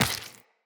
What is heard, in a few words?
Step Flesh, Footsteps Walk; Tenno